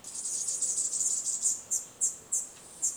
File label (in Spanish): canto rbenano, Reinita del Bosque Enano de Puerto Rico